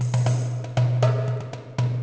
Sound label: arabic music; Ambient; MX vs ATV Unleashed